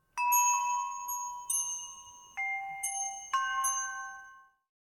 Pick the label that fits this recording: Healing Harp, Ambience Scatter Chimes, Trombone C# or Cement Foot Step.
Ambience Scatter Chimes